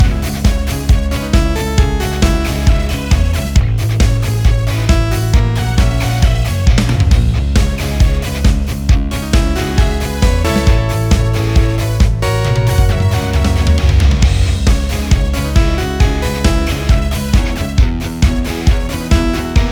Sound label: Classic Bgm Loop; Timberman VS; ps4